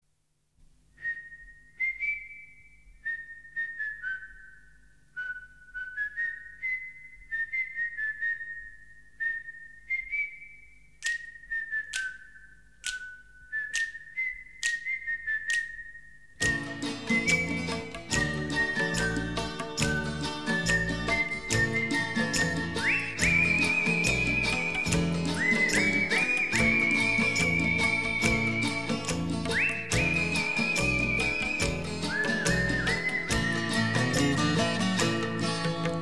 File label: WHISTLE RINGTONE; SAUDAGAR